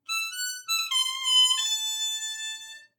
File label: final lick